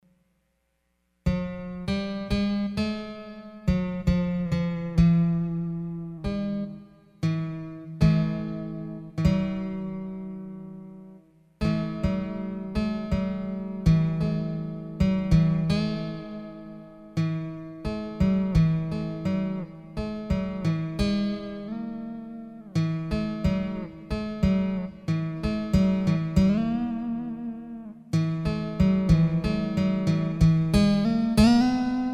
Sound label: fourth based 12-tone keyboard scale; tuning survey